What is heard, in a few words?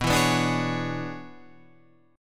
E 13th B